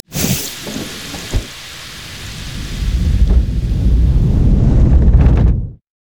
barrel roll foam; LoL; gragas

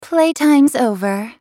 attack; AhriSkin04

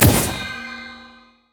item visionward; LoL; items

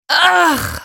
dying; Nidalee; Character Voices - English

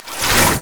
bramble zone splash; LoL; zyra

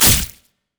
hiddenblade; Zed

